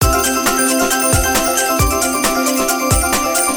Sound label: count up, slot; Hot 40